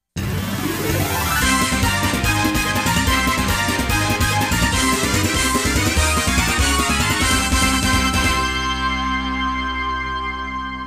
Midi; Salamander Portable; PSP; Xexex

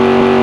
SFX - Engine; Twisted Metal 2

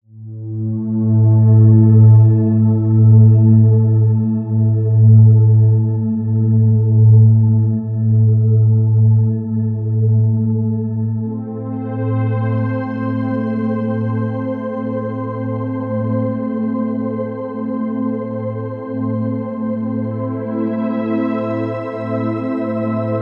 Ambient Loop; Drox Operative 2

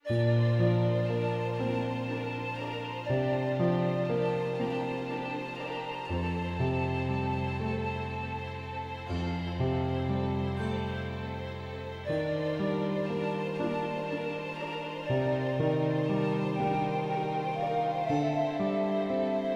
BGM ADV Pain; aster tatariqus android ios mobile gamerip 2023

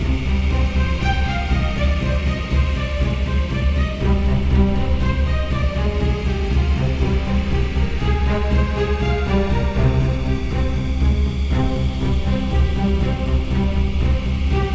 musician skill blue music loop; override mech city brawl ps4 switch windows xbox one gamerip 2018

